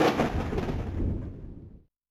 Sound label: Thundr; Yamaha DTXpress-II